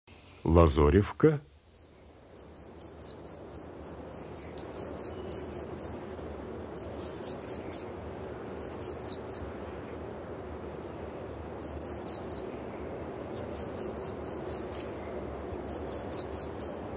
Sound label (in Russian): obyknovennaya lazorevka golos